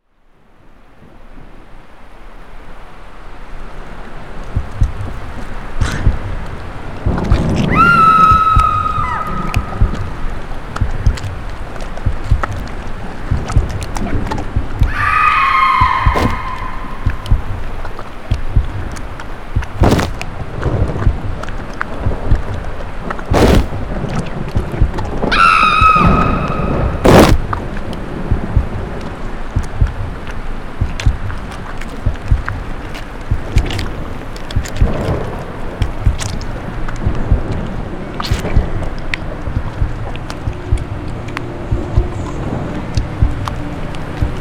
halloween sound effects being ripped; Halloween Nightmare CD Sound Effects

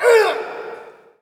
Hockey Male Hurt; SFX Kit; Hockey Vocal Sounds

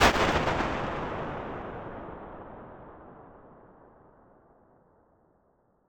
DAMPED CAVE, Impulse Response

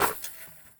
Street Ball Chain Swish; SFX Kit; Street Basketball

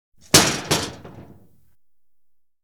Audience Applause

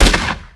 Gun Pistol Shot; SFX Kit; Weapons

